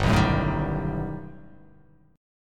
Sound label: G Augmented Major 9th